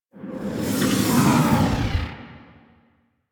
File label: Portal Enter; Missions; Zariman; Zariman Exterminate